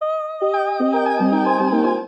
Hocketing Voice C Minor 115 bpm; Voice Box; Chromatic; Transformed

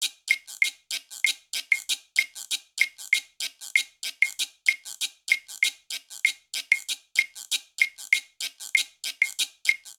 Songo 2 Guiro Claves 96bpm, Latin Percussion; Ableton; Factory Packs

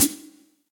Hihat Foot; Cold Tight Room; Drum Hits; Standard Hits; Ableton; Factory Packs; Session Drums Studio

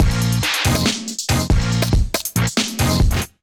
Kit Melodic 140 bpm, Drums Complex; MIDI Clips; Ableton; Chop and Swing

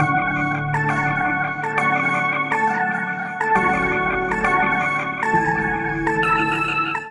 Strange Voices F# Minor 135 bpm; Voice Box; Chromatic; Transformed

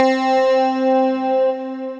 Gliss Guitar (Multisampled), Placid Plucks; Ableton; Mood Reel

